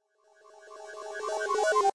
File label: Uncloak; wow weakauras